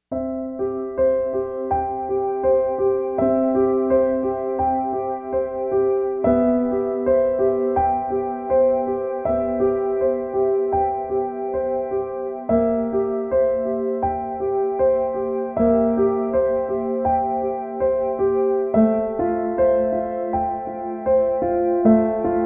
no ambience, An intermediate level piano solo with a nod to minimalism. The mood is calm and ref; The mood is calm and reflective.